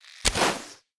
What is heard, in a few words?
Bow launch; we are the dwarves gamerip 2016